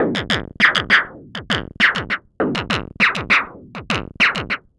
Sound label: perc 100 gravel, Percussive Loops, 100 bpm